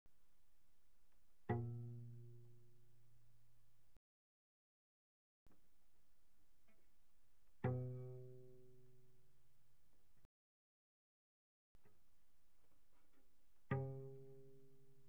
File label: Cello pizz pp sul C B2 G3; Strings